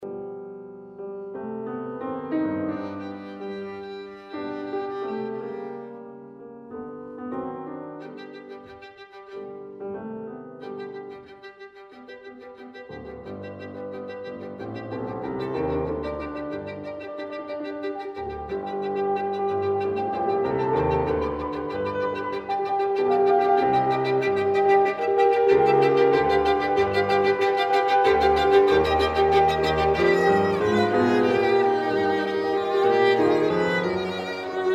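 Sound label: violin sonata; raff; song of destiny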